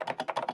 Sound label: Friend-Typing